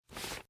draw

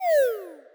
flappy bird die

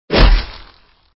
damage

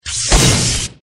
damage thunder; hujia

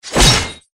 damage; hujia

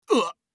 die male

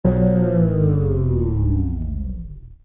lose

